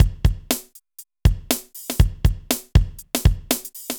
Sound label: Synth Loop, BPM120